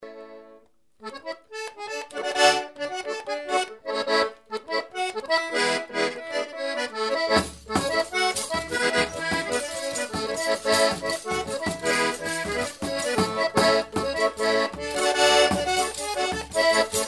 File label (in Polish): Krakowiak